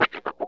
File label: wah snip, Funk Gtr & Wah